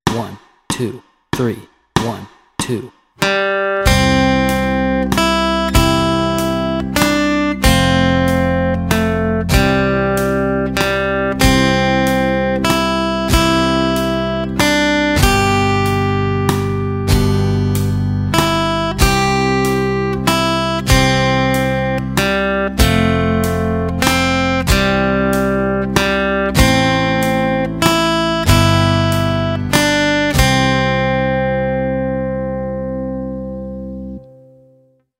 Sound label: Amazing Grace Melody and Chords Track, guitar